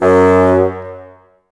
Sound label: Bassoons F#2; Miroslav Vitous - Woodwind Brass Ensemble